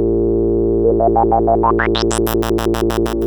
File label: MOOG A3, Synthesizer; Hollywood Edge; Giorgio Moroder Rare Synthesizer Collection; MLTI.MOOG